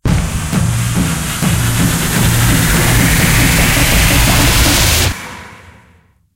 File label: near win long; rome